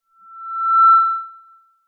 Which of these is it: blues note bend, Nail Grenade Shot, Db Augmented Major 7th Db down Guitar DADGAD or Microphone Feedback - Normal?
Microphone Feedback - Normal